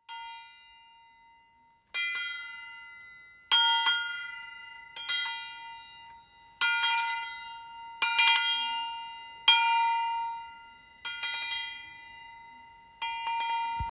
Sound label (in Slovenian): Vetrno zvon zen gong